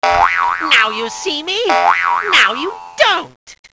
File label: boing; garrysmod; taunts; props custom